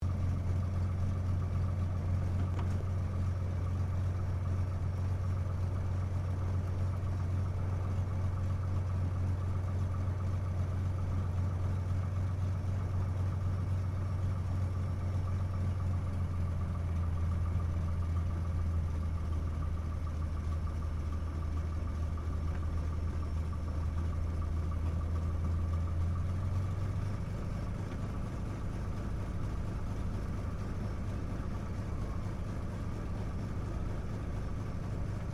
AIRCRAFT CT4A TAXI SHUTDOWN INTERIOR, military trainer aircraft; Airplanes